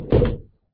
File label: sound close; replicaisland